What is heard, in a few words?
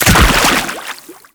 Land Heavy Water; Enemies; Footsteps; Warframe; Teshin